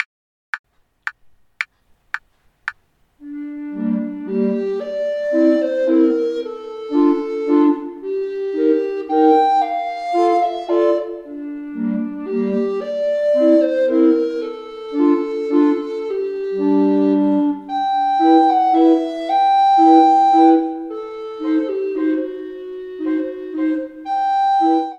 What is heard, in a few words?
Valse triste, recorder